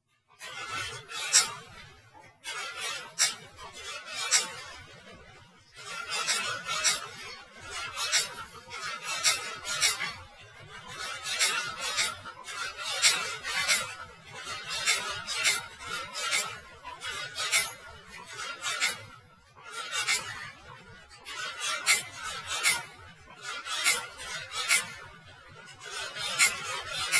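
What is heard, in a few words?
typewriter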